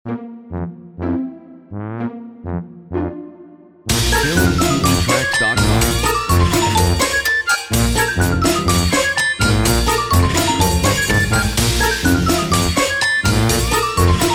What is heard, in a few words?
Animated duck